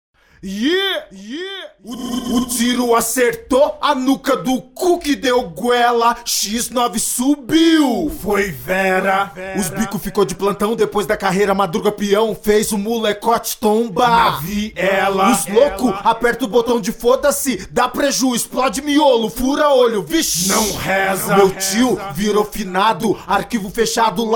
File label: acapella